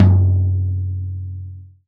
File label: J T 2 MF, TOM JAZ